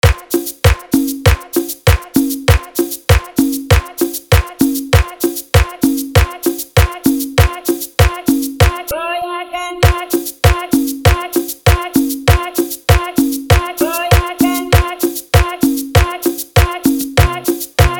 De Cajon Remix

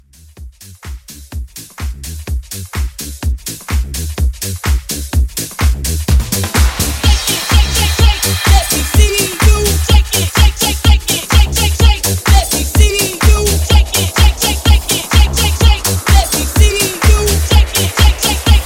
Halloween Mashup

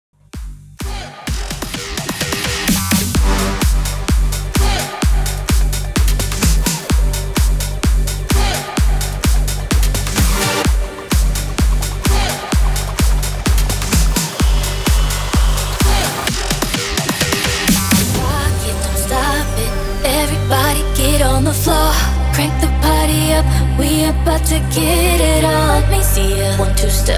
BOOTLEG MASH 128 BPM

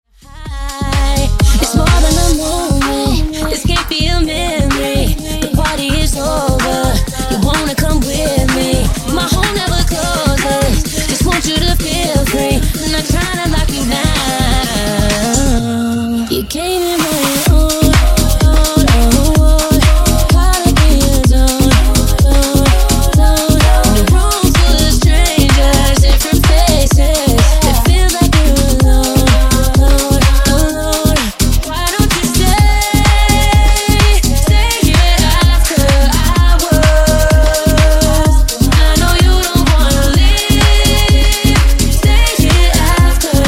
Mash Up 128 bpm

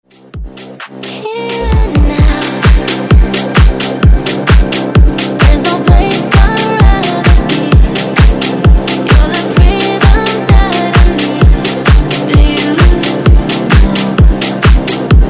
Dance Redrum

